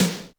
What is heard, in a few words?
snaredrum, Yamaha RM 50